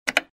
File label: close